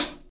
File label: rich top end hat D key, Loudest frequency: 11439Hz; hat samples